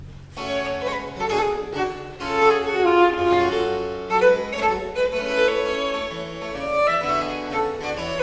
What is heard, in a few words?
Gavotte; Suite from Les Fetes D'Hebe; Rameau